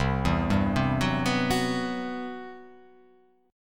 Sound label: C Major Sharp 11th C down; arpeggiated; CM#11